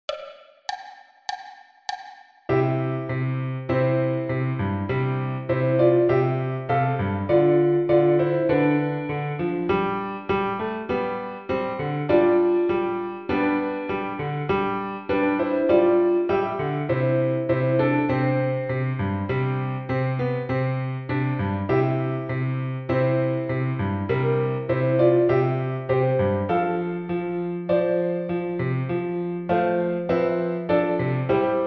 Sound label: tango